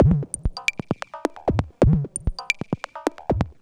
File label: Electro Perc, Dance Beats